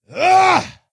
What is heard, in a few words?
attack; Skadi The Ruthless